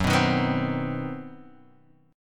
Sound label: C Minor Major 7th Flat 5th Gb, chord